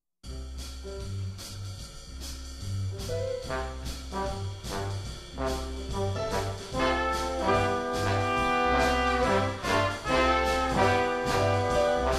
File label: Swing Jazz Combo; Premier